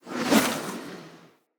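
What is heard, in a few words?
Corrupted Slug Thorn Projectile Whizz By; Creatures; Abilities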